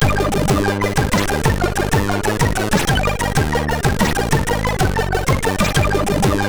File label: sid MOS6581R3